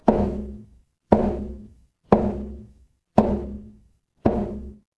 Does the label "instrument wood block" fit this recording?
Yes